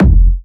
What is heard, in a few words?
Techno, Kick; Techno Sounds 2024